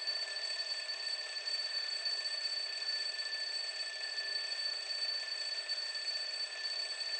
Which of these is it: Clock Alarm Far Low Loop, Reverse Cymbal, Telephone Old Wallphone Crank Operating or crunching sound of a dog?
Clock Alarm Far Low Loop